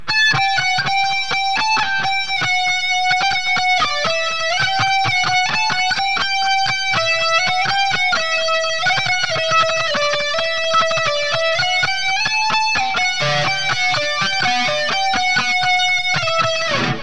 CUBE-30 R-Fier delay chorus; Roland

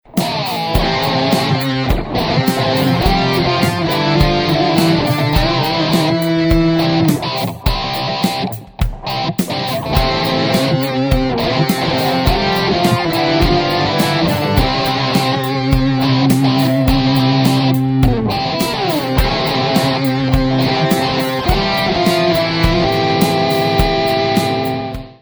oktaver; BOSS GT-6

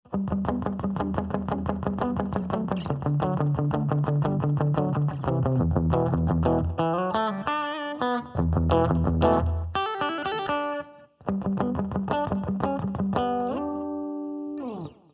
riff clean, guitar; am at4050 30cm minus sm57 30cm

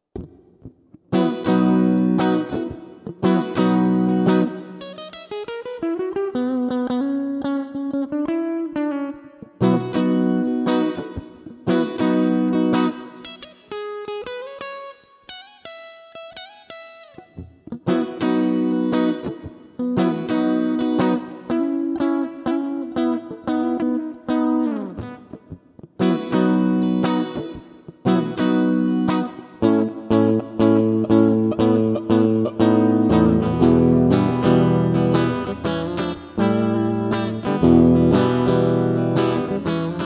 acoustic, SMB Tube Talisman and BOSS GT 6; акустической / гитары; Очень впечатлило звучание

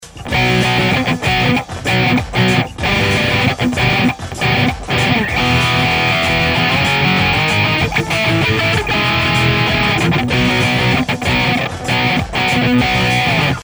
riff2 dist, guitar; SMB Tube Talisman; канал-metal

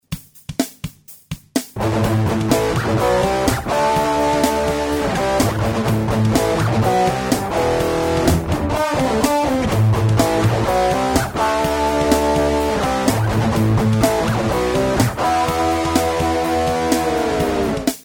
riff dist, guitar

